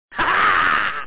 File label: DEFEAT; dosgames; labyrint